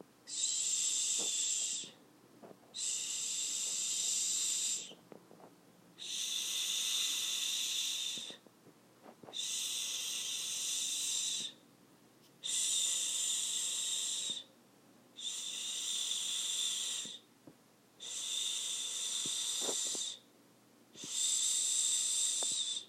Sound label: shh